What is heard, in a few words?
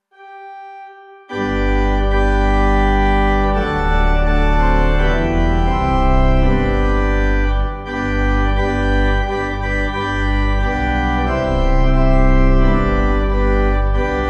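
pipe organ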